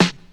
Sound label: clear snare sound e key